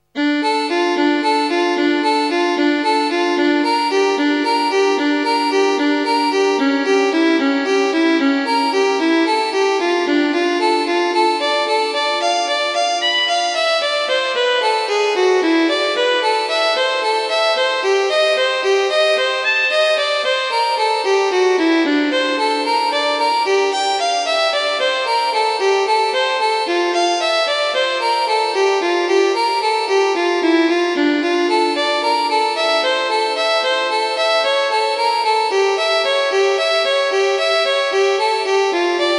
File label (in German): praeludium d moll; nach bach